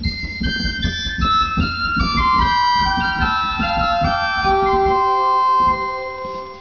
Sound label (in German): Superoktave 2; Martin